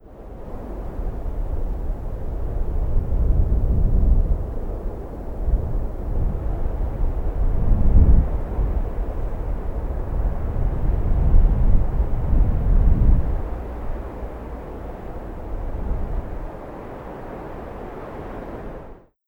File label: Blackrock Wind